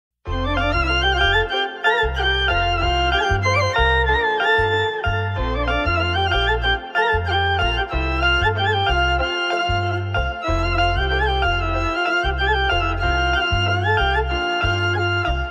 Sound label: Khatu Shyam Soulful Bhajan